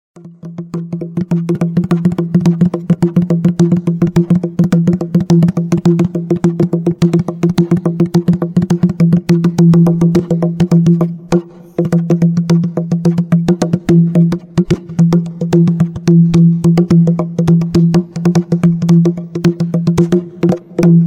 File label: Drum